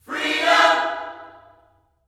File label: FREEDOM, Vocal Planet Gospel